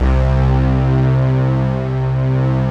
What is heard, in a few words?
Obie Stack, OBlip; Optical Media International; Sonic Images Library